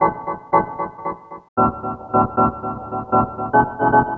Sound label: Organ; Sonic Foundry (Sony Creative Software); Stylus Pressure Urban Grooves on Digital Wax